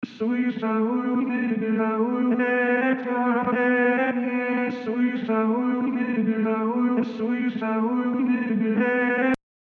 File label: Vocal Loop 103